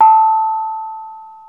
BONANG PAN, Bronze Gamelan

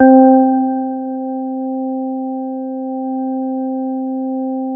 C3, Roland JV 2080 CLUB ORGAN

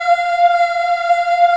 F4 child EH R, Choir; USB Soundscan vol.28

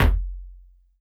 Elektron Monomachine, Drum Machines

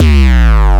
BASS, Drum & Bass, 168 BPM; Ueberschall; Drum & Bass Flash Trackz

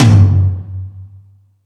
Stadium Rox, Drum Machines

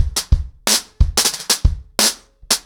90BPM, ACOUSTIC DRUMS

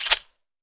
CHEKERE; AKAI S-Series CD-ROM Sound Library VOL-5

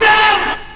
VOCALS, House

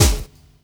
SD, dance drums hip

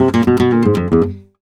gta e, Flamenco, Guitar, Picado

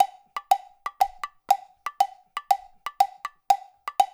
percussion loop; InVision Interactive; Percussion Slam; PROGFROG

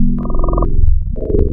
MOOG MINI, wavetables

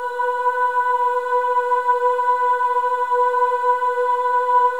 AH B4, WOMEN AHS; Spectrasonics; Symphony of Voices

